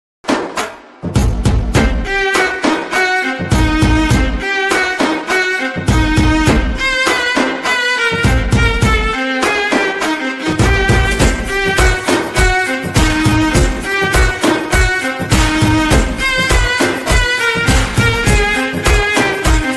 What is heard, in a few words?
BGM Ringtone; Pushpa